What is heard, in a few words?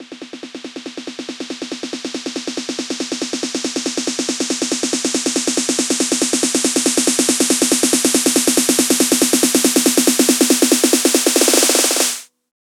140BPM SNARE; Vengeance Samples; Snares&FX Pairs